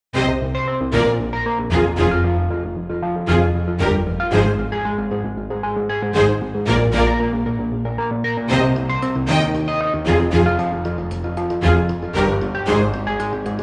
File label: Action Light